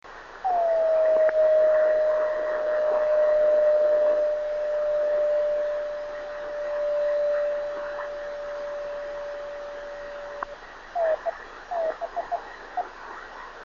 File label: 26510KHz, driftnet beacons